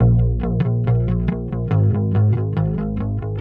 bass, Loops Phonky

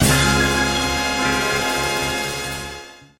fanfare, Stabs

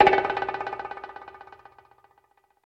roll, toms; Real

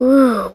"woo" girl, voice; ooh ahh; ho hoo woo

